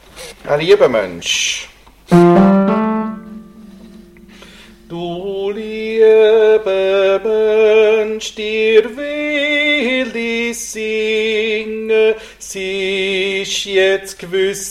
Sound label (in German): 2. Tenor